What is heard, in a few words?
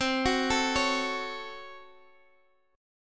C sharp Major 7th Suspended 4th Sharp 5th